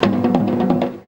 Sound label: PERC, India